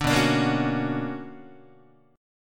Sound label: B Minor Major 9th, Guitar; BmM9 strummed; JGuitar